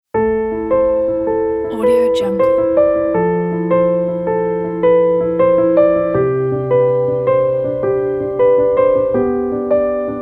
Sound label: inspiring day story